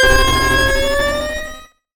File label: Retro Ascending Short